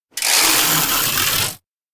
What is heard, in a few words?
row fast; anemoi